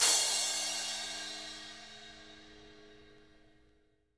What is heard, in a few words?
CRASH, Drum Kits